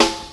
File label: Crisp Snare Sound C Key, snare drum tuned to the C note. Loudest frequency: 2409Hz